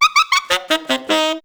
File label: JAZZ